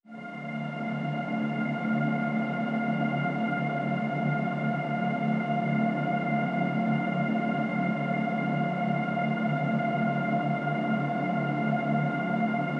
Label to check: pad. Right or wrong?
Right